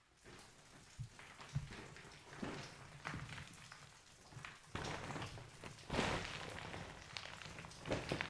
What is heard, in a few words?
rockfall